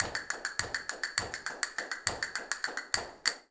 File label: Castanets, Flamenco